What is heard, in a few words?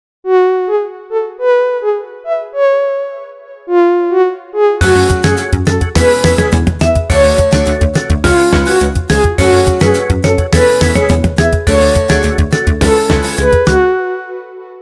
fsharp bar